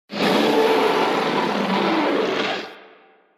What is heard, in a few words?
spinosaurus sfx